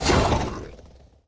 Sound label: enemy dash; bloom minigame